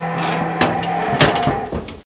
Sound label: groan; XWingTie